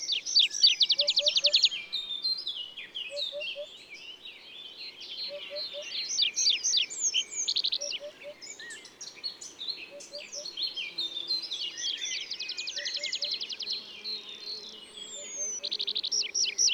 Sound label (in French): Pipit des arbres; ABC des chants d oiseaux